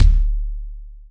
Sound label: kick, Kawai XD-5